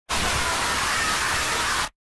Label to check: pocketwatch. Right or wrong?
Right